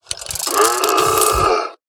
Shambling Zombietank Play

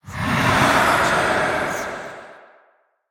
Spirit Of The Dead, Attack

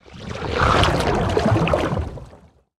Underwater Movement Attack Underlay

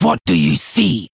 Male Dwarf Attack

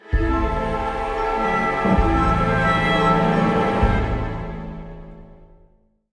Stinger; Loken Jailer of Yogg Saron

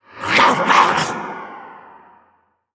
Female Murloc Attack

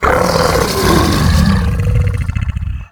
Withered Longneck Death

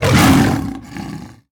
Tiger Form Attack

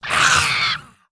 Rat Attack

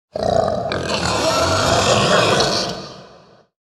Blight Boar

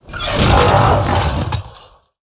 Attack; Toybound Giant

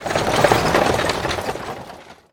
Carriage Underlay Attack

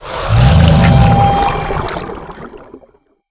Orca Attack

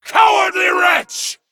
Dragon Emote Attack

